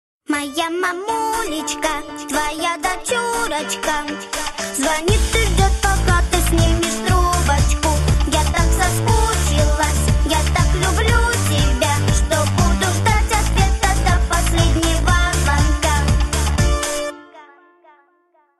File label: Russian Rington